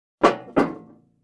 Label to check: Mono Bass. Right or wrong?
Wrong